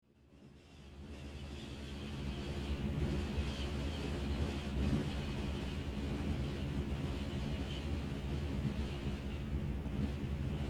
Cave Wind, ambience

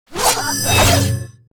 peace powerup

